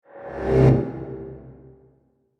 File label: Whoosh Vox Reverse; Production Element; Whooshes (Sound Effects:Sound Design); SFX Bible 6